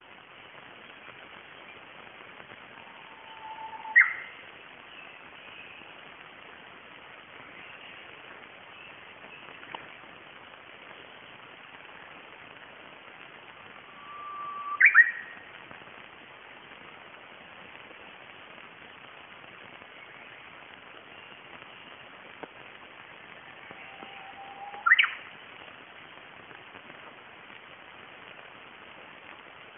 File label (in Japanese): uguisu